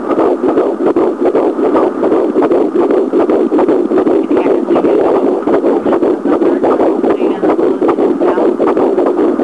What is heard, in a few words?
heartbeat